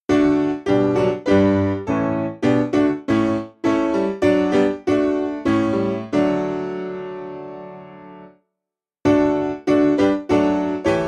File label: Piano